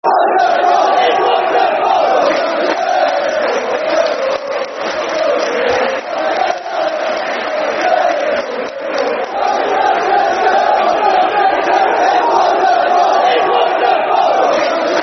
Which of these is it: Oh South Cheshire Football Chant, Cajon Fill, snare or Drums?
Oh South Cheshire Football Chant